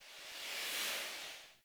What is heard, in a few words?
Player Jump Heavy Attack Start Wind; Falling Wind